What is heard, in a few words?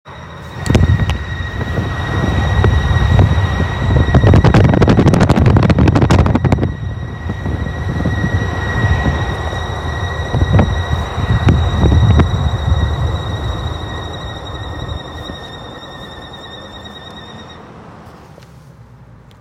2020 ranger squealing while driving; 2019 ford ranger